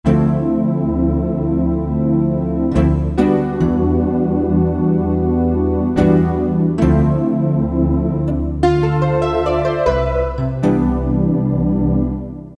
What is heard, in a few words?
Nylon Atmosphere, Roland D-50; Factory preset demos